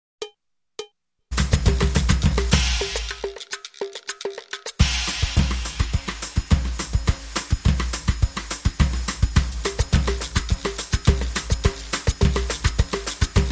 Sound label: Samba Loop